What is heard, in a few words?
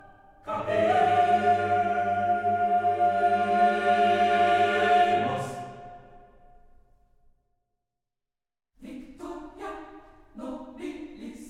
Battle Heavy Layer; The Dwarves 2016 ps4 gamerip